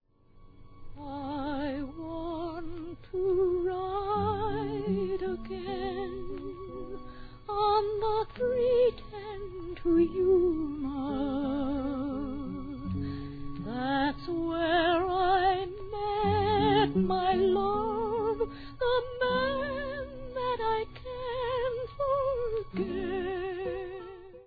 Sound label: A Girl Singing; 3:10 to Yuma